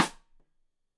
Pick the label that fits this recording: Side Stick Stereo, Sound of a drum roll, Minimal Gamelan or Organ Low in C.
Side Stick Stereo